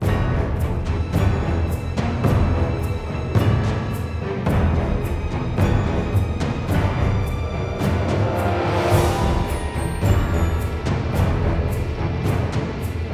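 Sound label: background music battle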